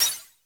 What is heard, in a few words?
GLASS CRUSH; Crypton - DrumBase5000